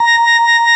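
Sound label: Organ Vox A#5, Continental, Vox Wah Bar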